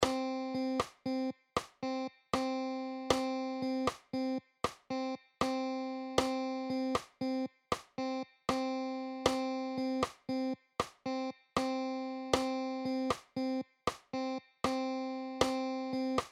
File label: afro cuban rhythm 6 8 at 78 bpm; Afro-Cuban 6/8 Clave on Guitar